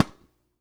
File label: Wack